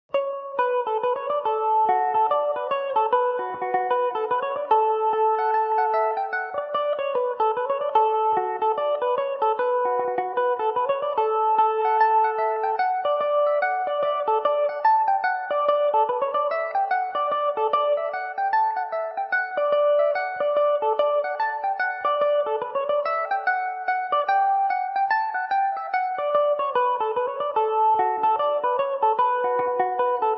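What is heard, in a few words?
D major revelation, irish mandolin; A Ha’porth Of Tea; Revelation Jaguar electric mandolin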